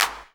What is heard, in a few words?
clap, hip; Best Service; Dance Mega Drums